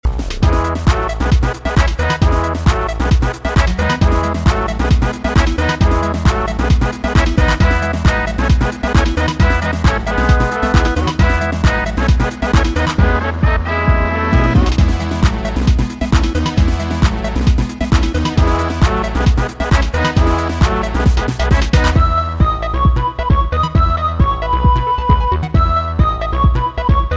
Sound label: fight song; mario rpg